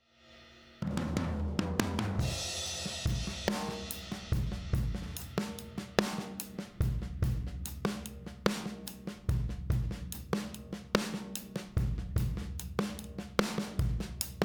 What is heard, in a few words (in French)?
drums avec